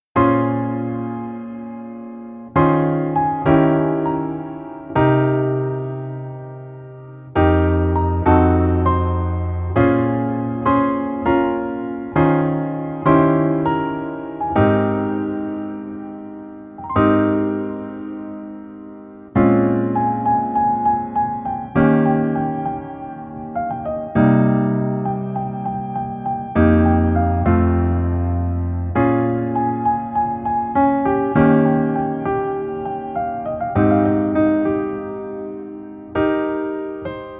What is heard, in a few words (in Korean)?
Piano ver, 멜로디MR